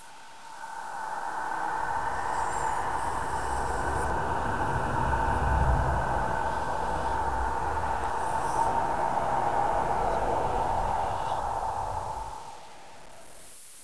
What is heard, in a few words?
ambience